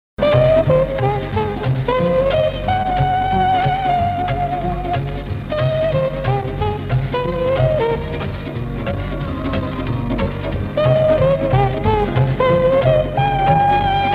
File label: Jazz melody